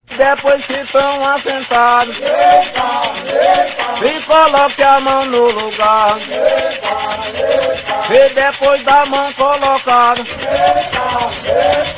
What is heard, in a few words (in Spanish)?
ganza o xere